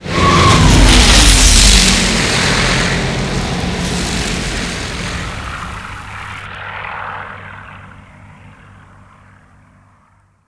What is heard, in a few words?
P51 Mustang